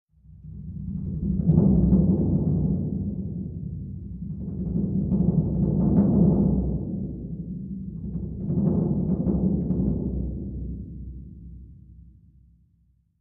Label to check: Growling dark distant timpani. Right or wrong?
Right